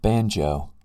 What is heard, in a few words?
banjo